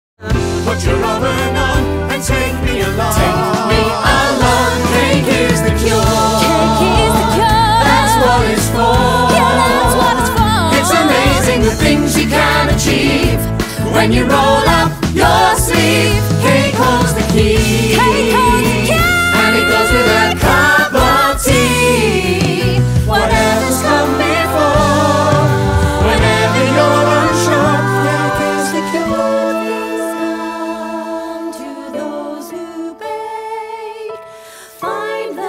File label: finale ensemble vocals